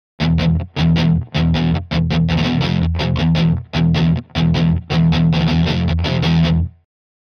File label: Guitar Slow Heavy Metal Rhythm - Long; Hollywood Edge; sound designer's toolkit v2